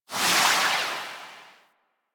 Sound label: Alchemist Distill Ability Loop End; Warframe; DE; Warframes